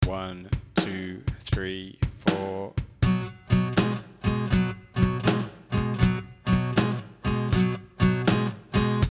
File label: blues e b spread, guitar